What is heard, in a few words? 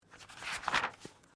Book Page Turn